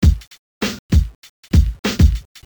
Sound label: depressing rock music